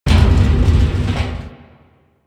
Metal Barrel Slide

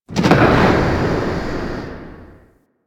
Galleon Eerie Amb; Ambience; Warframe; Grineer Galleon

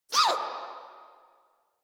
Pain; Grineer Jester

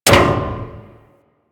Ship Hit Effects; Warframe; DE; Vehicles; RailJack; RailJackHitEffects

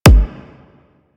Briefcase Akimbo Pistol Punch; Warframe; Weapons; Corpus

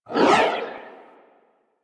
Cast; Warframe; Nezha

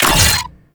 Infested Shotgun Teleport Error; Weapons

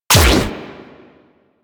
Fire; Warframe; Acrid

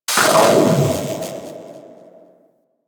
Bigalyst Electric Step; Enemies; Steps; Warframe; Teralyst; Eidolon

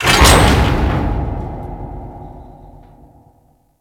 Metal Tube Hatch Close; Warframe; Grineer Ocean

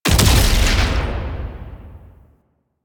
Turret Projectile Explosion; Warframe; Duviri; Duviri Turrets

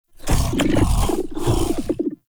Infested Shotgun Idle One Off; Weapons

